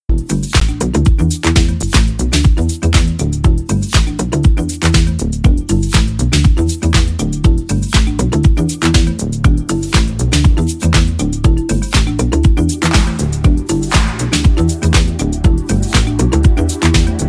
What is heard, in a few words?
Marimba Message vocal mix